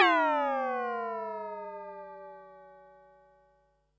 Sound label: Perc, Percussion and FX; Pearl SC-40